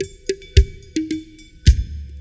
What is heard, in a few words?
conga shifted, 位相をずらしたコンガ音（500～1500Hzを90度回転させた音）